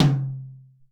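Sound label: Room Tom, Alesis SR-18; RoomToomM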